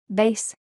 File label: bass